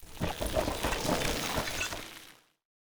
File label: Bind Sound